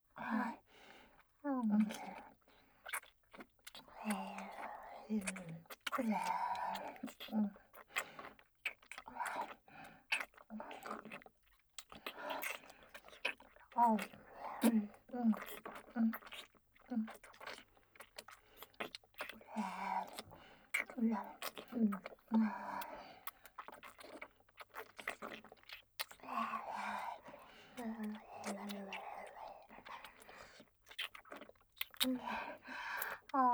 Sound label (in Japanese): サキュバス姉妹のWフェラループトラック, ASMR; ダウナーオホ声WサキュバスJKと激狭ワンルームの生活音垂れ流しだらだらえっち同棲